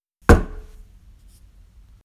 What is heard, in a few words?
knock